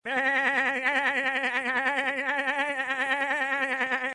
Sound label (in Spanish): risa; Peter Griffin